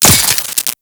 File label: Head Shot Metal, Bullet Impacts